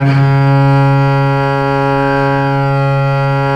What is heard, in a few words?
VL C#2, VIOLE, Historical Instruments; USB Soundscan vol.23